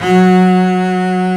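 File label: Bass Legato F#3; Digital Sound Factory Virtuoso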